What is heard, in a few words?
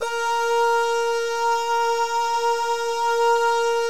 BAH GUY, Vocal Planet Jazz & FX; ILIO; BAHS GUYS